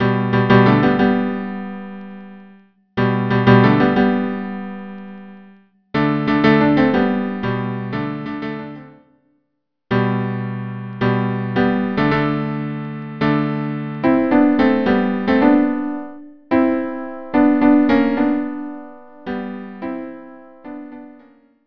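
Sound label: Duets cello cello; Schubert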